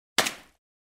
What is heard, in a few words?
fights